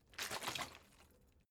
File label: bottle water sparse shake single soft; berufe